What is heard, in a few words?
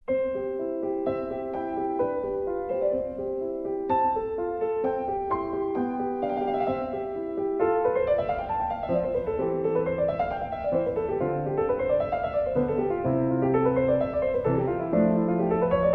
piano sonata in c major; mozart; no 16; k545; 1st mvt